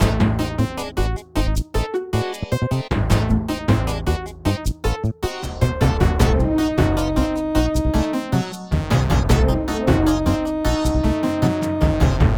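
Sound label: background music cutscene simplified; dragons valley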